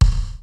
kicks snare, kid; Kid Nepro; Elite; KICKS SNARES

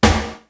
drum, EMU Proteus; Proteus1

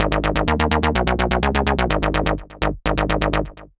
Ab 126, Synth Loops, Acidized; Zero-G; Soundclash Nu Dance Electro; 126bpm

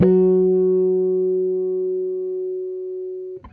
JAZ OCT SUS, guitar; Quantum Leap - Guitar & Bass

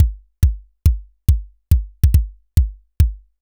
Anabeat Kick; Sonic Foundry (Sony Creative Software); Prototechno

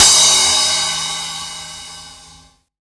Splash, breakcore Drum Hits

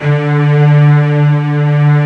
ANA STRG, 01W BAROQ; East West; Global Sounds

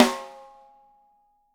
wood; Sampleheads; Peter Erskine Living Drums

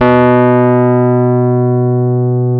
B 1, Electric Pianos; Wizoo

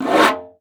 STREI FG, BONGOS; Xsample VOL-9

